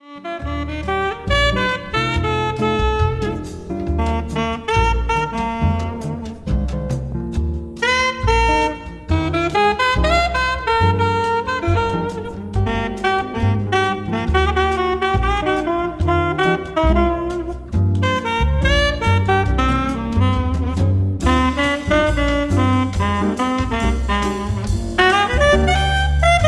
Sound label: Smooth Jazz Lounge